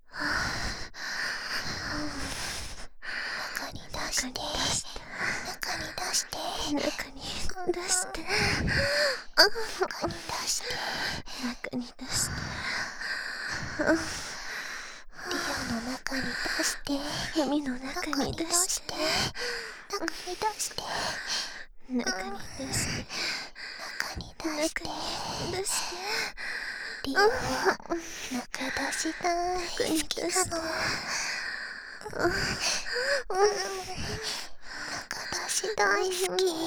喘ぎ; ハーレムオナサポ 4人のメイドがご主人様のオナニーをお手伝いするバイノーラル～文、千曜、名月、莉桜～

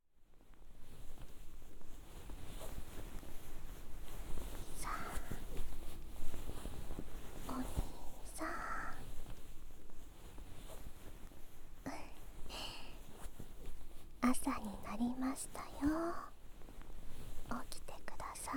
あったかーい吐息で、頭ふわふわにさせちゃいます♪, ASMR

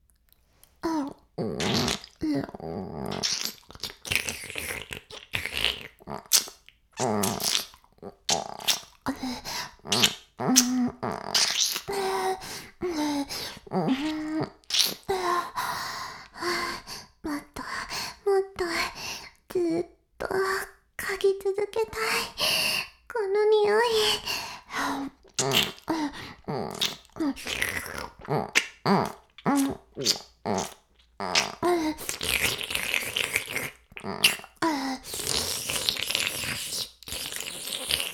フェラ音声, ASMR; 白くて黒い魔性円環

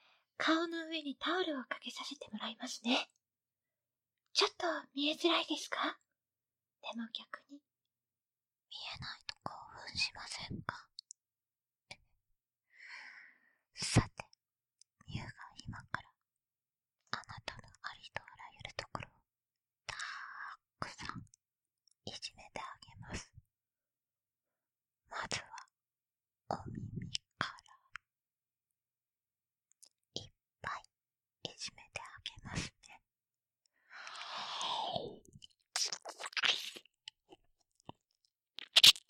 耳舐め, ASMR

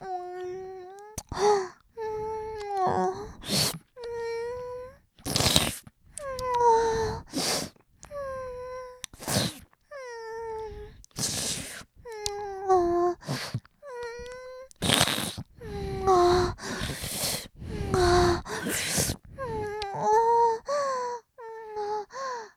ディープキスしながら; ヘンルーダ（オオカミ魔女でアルケミストな花屋さん）; とろ落ちックス

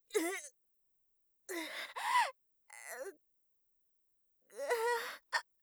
痛みの余韻

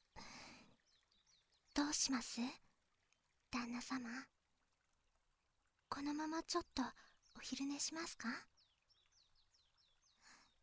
お昼寝吐息; そのままお昼寝吐息

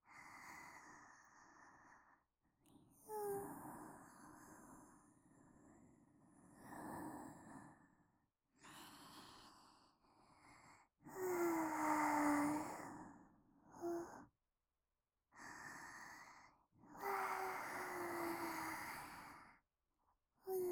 寝息ループ

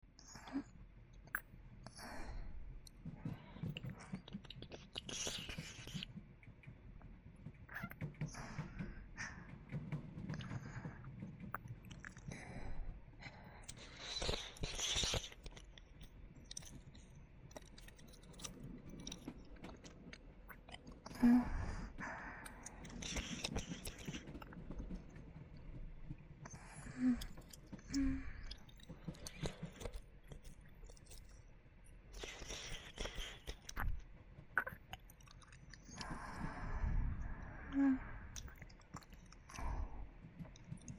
電車耳舐め左耳, ASMR

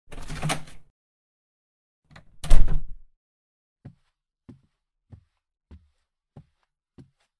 フルーツ飴のできあがり♪（食事音:フルーツ飴）ASMR

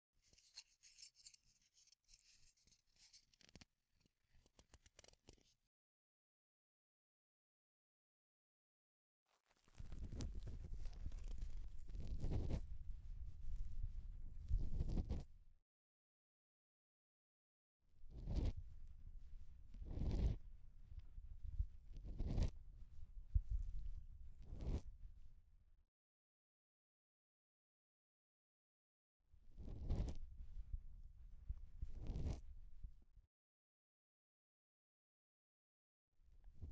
(左) 竹耳かき 耳穴入口付近の音; 耳かき音部位分けVer.

